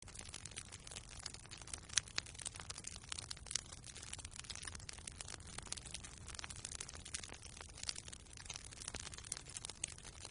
fire camp sound; Caravan Beast

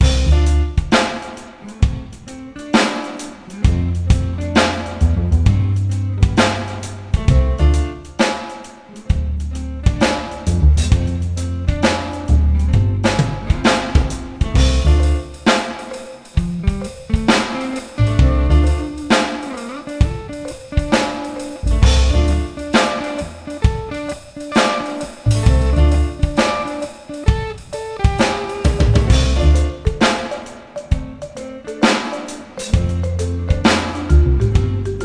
Main Music

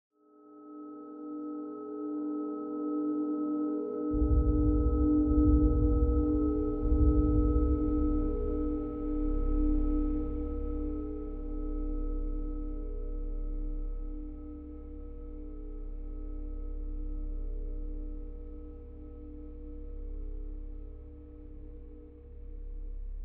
Dark Scary Explore Mood; The Last of Us

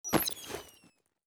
VR Off; PCBS; Aorus

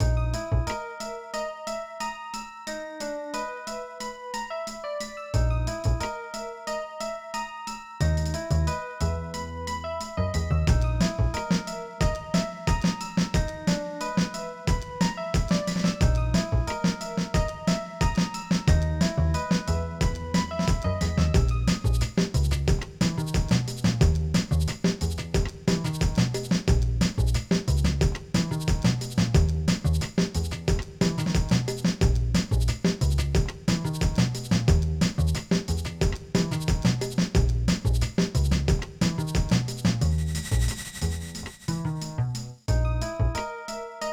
Lose; Extreme-G 2

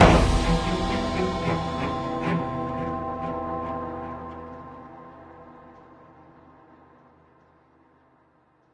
Death Stinger; runic rampage

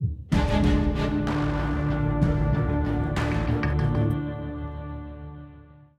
190bpm; Legends of Pegasus; windows gamerip 2012

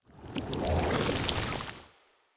Corruption Vanish; children of morta; Forest Level

